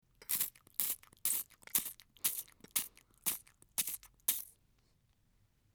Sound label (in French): Liquide Menage pshit pshit; mouth-fishing